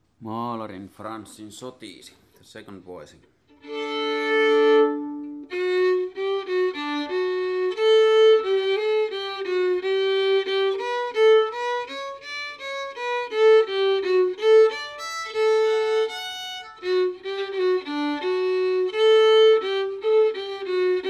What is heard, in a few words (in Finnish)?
sotiisi harmony; Maalarin frannsin sotiisi